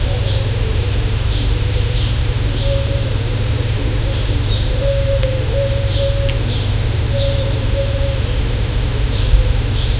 soft morning ambience, early morning

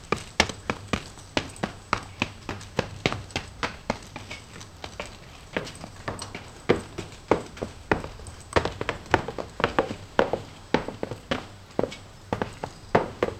blend the rhythmic thud; everyday life

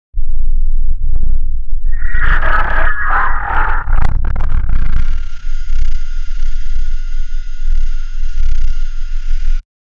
ultraviolet light sound

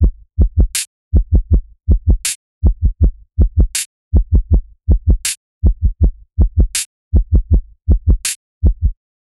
80bpm, heart

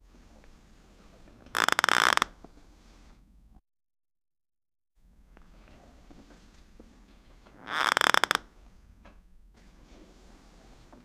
clapping while bed squeak; indoor

